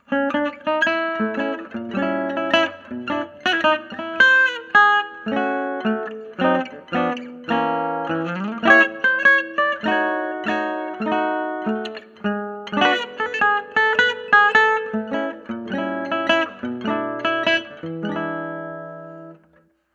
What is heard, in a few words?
IR straight piezo